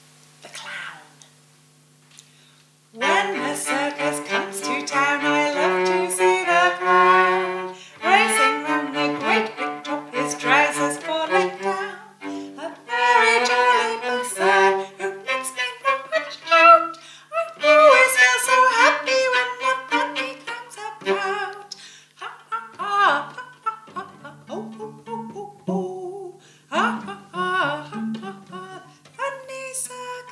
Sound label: The Clown Vocal